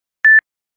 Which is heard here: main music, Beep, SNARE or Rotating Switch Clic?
Beep